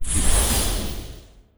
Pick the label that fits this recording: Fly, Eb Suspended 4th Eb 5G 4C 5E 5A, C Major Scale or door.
Fly